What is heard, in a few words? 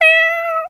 meow